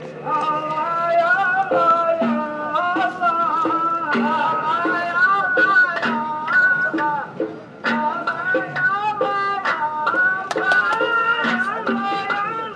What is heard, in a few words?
Shop music